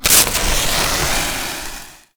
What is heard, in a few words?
Proto Infested Maggot Spark Start; Warframe; Abilities